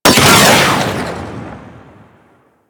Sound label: Arch Cannon Fire 3D, Weapons; Warframe; Archwing